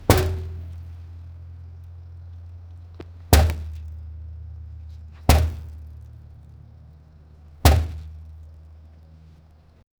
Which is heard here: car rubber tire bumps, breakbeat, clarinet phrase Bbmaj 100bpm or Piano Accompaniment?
car rubber tire bumps